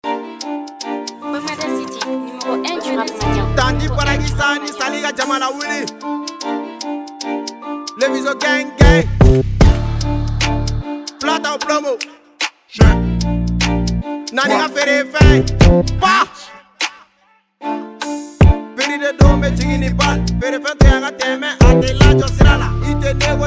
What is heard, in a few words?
TRAP BEAT